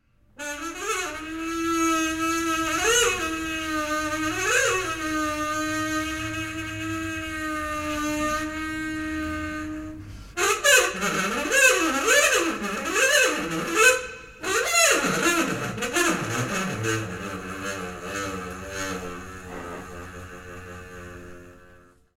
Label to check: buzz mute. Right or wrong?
Right